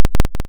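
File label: ring modulation sqr tri, env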